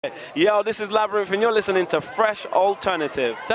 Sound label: Jingle